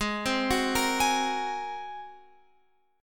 G sharp 9th G sharp Guitar Open F; G#9; arpeggiated

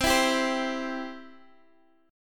C Major C down Guitar Drop D

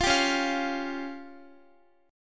Db Major Flat 5th, Guitar; strummed; JGuitar

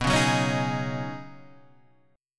C sharp 7th Suspended 2nd Suspended 4th B

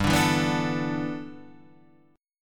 G 6th Add 9th

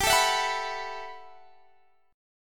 B 7th Suspended 4th G; strummed

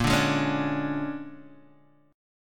Bb 7th Sharp 9th Bb, Guitar DADGAD; Bb7#9; strummed; JGuitar

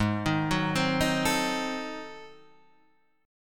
G sharp 7th Flat 9th G sharp, chord

